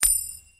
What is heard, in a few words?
coin start